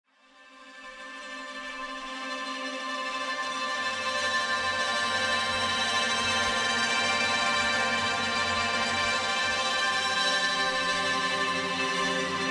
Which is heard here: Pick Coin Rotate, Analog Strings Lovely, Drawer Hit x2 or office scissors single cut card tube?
Analog Strings Lovely